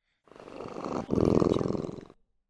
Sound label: creature snoring loop